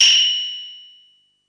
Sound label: FM Cymbal, harsh; Harsh FM Cymbals